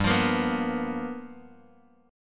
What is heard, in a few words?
G 7th Sharp 9th G chord, Bass Standard; G7#9 strummed; JGuitar